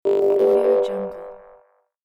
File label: scifi notification